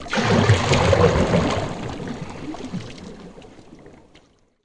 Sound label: Canoe Paddle Stroke Underwater